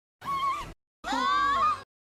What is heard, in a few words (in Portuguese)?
gritando